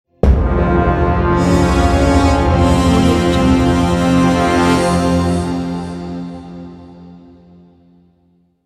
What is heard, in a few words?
power gate logo